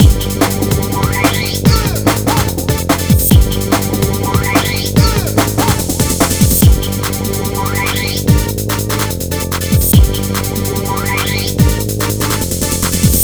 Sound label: Uprising, 145 Gmin, Hardcore Breakbeat; Big Fish Audio; Hardcore Breakbeat Action